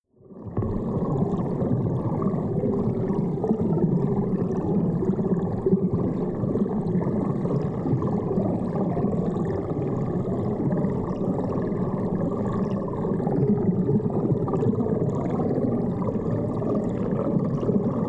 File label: water fx dark